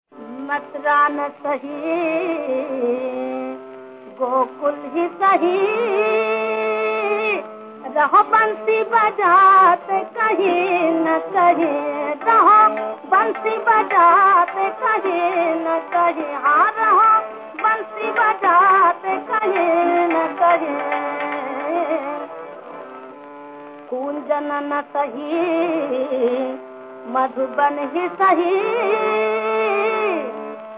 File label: bhajan; mathura